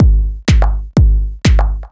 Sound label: Strip 124bpm, House Drumloops; Zero-G House Fabrik; 90 s Sample CDs